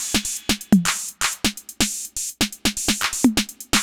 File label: drum sixty top 125, Drum Loops, Classic Chicago House